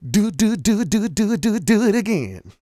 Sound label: SING, Vocal Planet R&B & Dance; ILIO